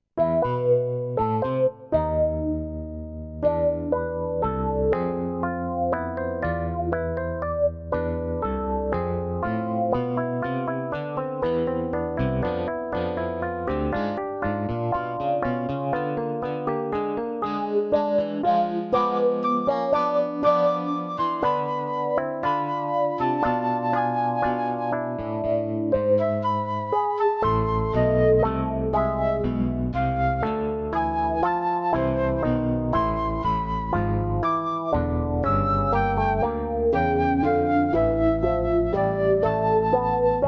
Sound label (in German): Floetensonate